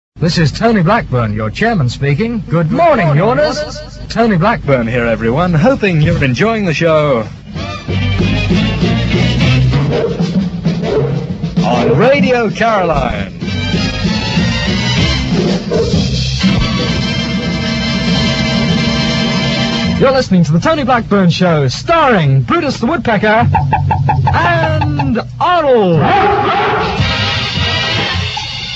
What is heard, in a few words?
yawn